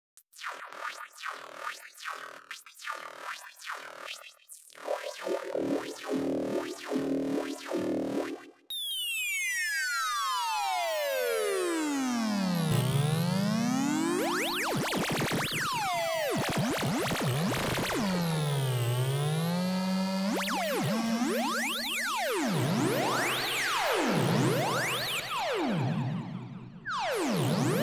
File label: Squelchy FM DRIVE